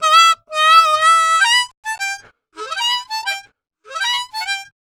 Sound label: Harm 098bpm A, Harmonica